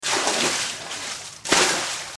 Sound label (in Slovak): Splech vody; Revelation Sight and Sound SK